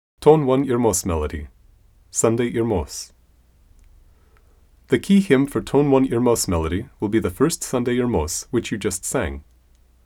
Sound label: Irmos, Tone 1; Sunday Irmos